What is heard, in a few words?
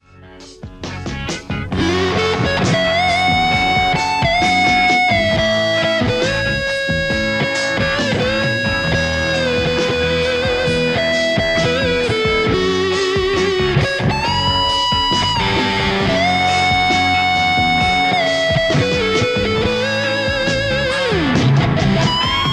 lespaul; poulin lecto